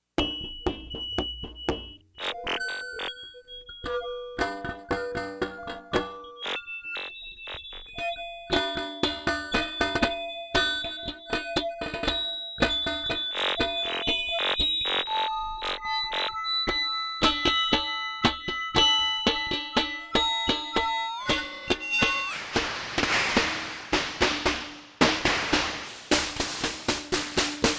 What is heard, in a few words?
sid brass; ciat-lonbarde; sidrax; sidrazzi; sidrassi